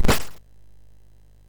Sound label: sid MOS6581R2; California Games